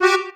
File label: Accord Missednote; Levels Hub Bayou; Music Riverboat